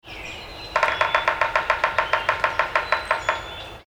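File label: Yellow-bellied Sapsucker Drum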